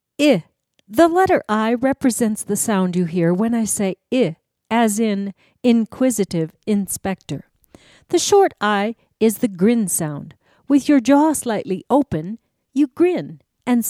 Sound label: Short I Claves